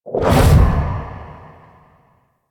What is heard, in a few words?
Reap Aim Activate; Warframe; Wraith